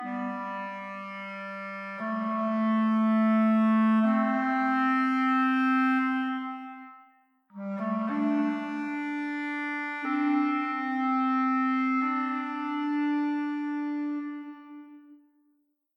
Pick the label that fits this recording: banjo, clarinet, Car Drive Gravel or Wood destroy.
clarinet